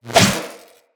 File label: Electro Prod Whoosh; Weapons